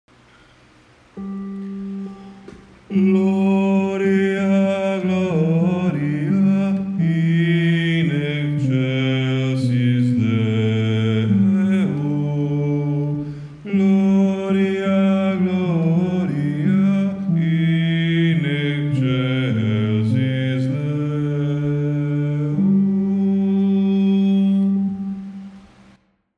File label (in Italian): Gloria Uomini; Lourdes 2013